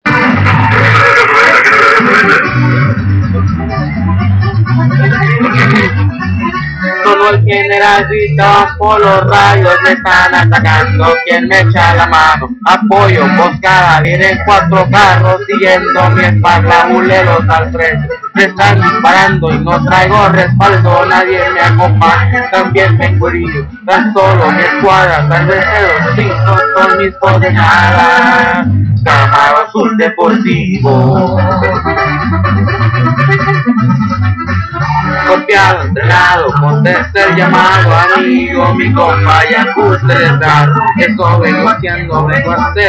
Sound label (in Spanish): corrido